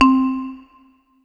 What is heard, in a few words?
Kawai K5000W Marimba C4